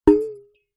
household thermos flask impact small amount liquid wobble